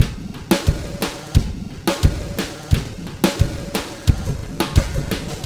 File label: Lounge Drumz